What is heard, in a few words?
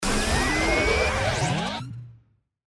Ascend Motor Rev